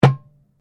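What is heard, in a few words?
Drum Darabuka Slap Mute mezzo forte, Egyptian Darabuka Drum; Commanly available Egyptian Darabuka Drum; Musical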